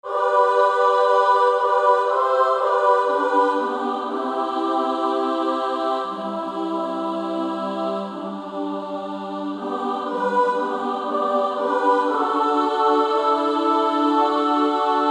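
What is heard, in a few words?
What A Friend We Have in Jesus SSA, Female Choir SSA 3-part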